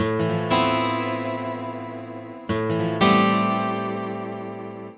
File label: Acoustic Guitar; Guitars